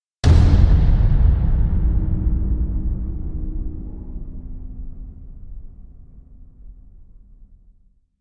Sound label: booms mammoth